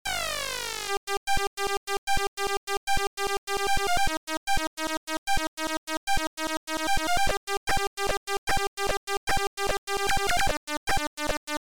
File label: Title Beeper; Ping Pong